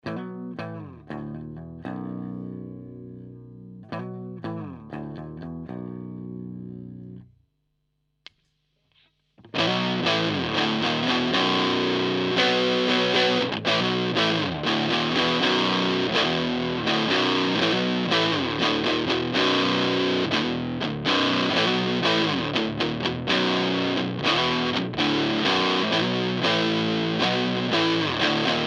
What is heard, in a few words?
EQD Plumes Mode 3